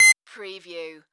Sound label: Beep Modulated Single; sound-effects-bible